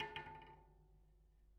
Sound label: Spoke Bounce, Bicycle